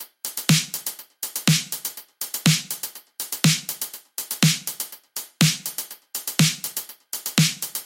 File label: 122 BPM Battery Full Drum Forge; full drum kit